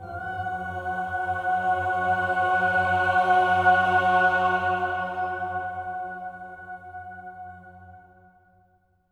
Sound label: OCT C#4, SWELL OCTAVE